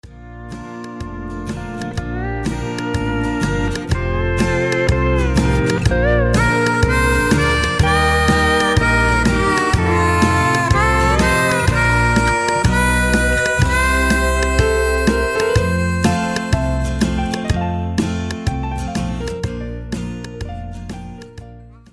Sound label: Instrumental Foxtrot